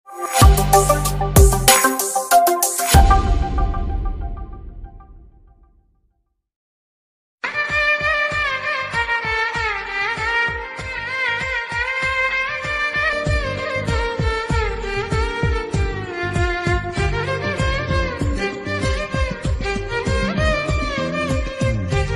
telugu love ringtone telugu ringtones tamil love bgm ringtones